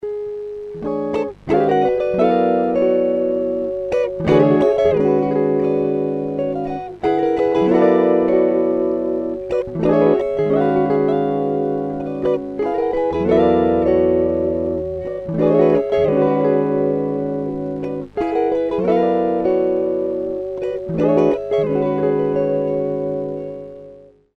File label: Bluesy Thin